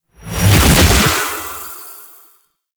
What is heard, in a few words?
Equip Impact; Noctua; Warframe; Dante